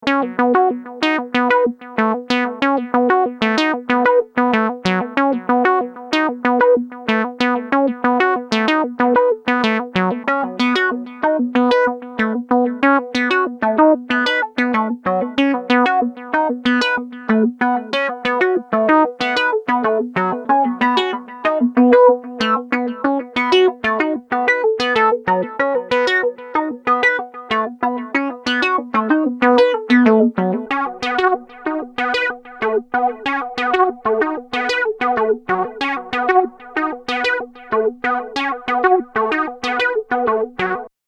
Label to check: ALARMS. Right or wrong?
Wrong